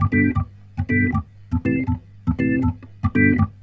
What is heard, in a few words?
Roots Reggae, B-3; Sounds Good - Roots Reggae